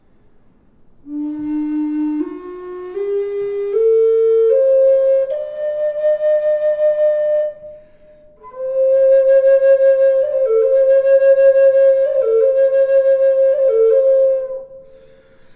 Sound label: reverb, wood flute